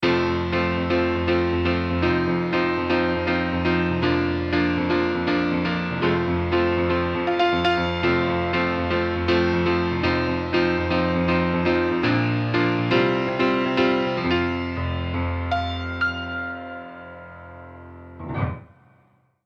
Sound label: Vintage Grand Amp; vintage grand sound style expansion